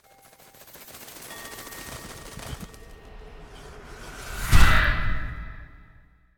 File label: Transformation